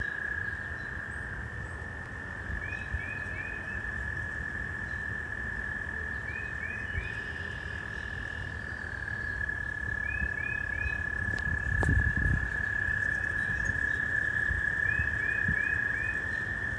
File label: Cedar Hill Sounds